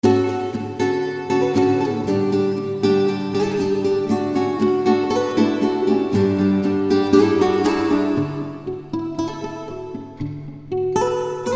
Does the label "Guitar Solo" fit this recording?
Yes